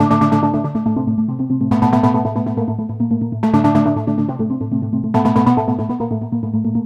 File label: NRG Arp, trance